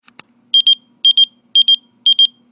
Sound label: old style beeper